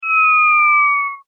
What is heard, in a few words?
Motion hyu-n